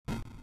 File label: fire space; fujitsu